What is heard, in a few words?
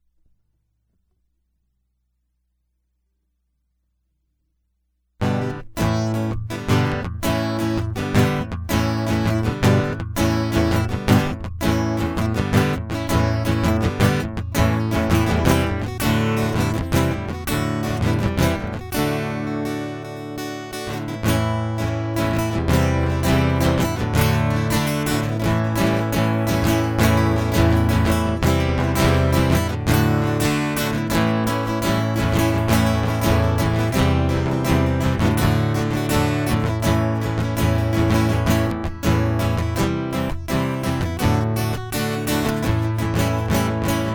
Guitar Loop